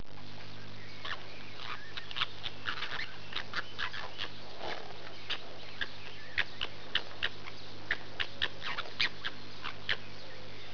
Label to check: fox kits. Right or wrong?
Right